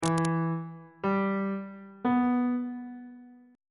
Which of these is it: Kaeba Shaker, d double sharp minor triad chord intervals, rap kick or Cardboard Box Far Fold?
d double sharp minor triad chord intervals